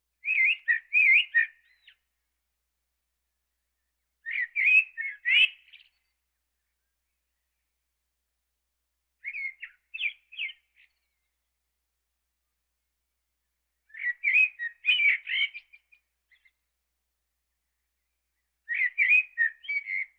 Birds

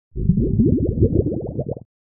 Bubbles Underwater Large - Submergence; Hollywood Edge; sound designer's toolkit v2

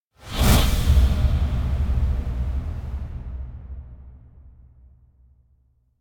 Whoosh Heavy Abstract; Hollywood Edge; sound designer's toolkit v2

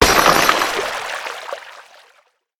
break; Apotheorasis

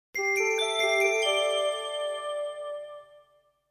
Jingles; 90 DDD Jingles

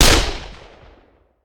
Machine Gun; Sound Ideas; Guns

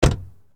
Chrysler 300M Door Close, Automobiles

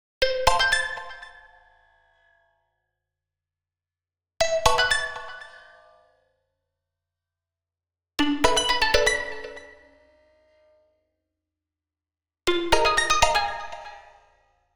Arcade Jingle Positive; boom library; The Interface

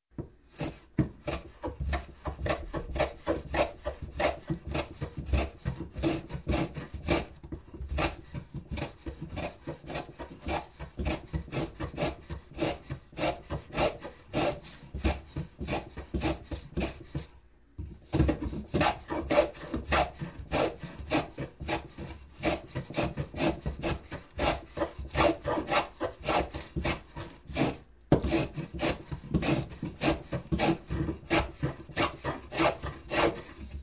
Construction, Plane Hand Plane Planing Wood; Sound Ideas 2000 Series

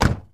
Mustang Door Close; Automobiles

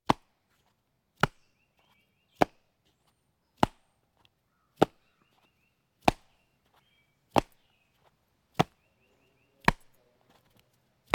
Crowds Race cars Baseball

